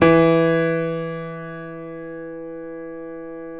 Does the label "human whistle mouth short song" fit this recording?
No